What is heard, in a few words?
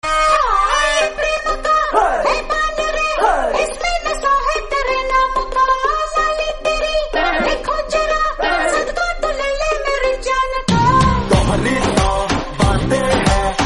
wedding song tone ringtone, bollywood ringtones